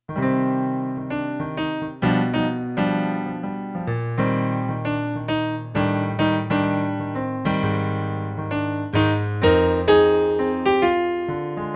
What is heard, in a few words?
Piano